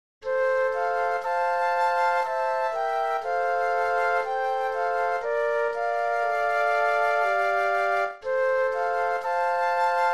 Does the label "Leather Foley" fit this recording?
No